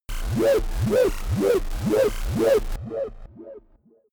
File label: shot futuristic wobble low, digital; soundpool